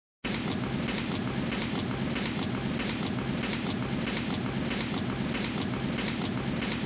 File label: Sound Mine cart sound (looping), flipper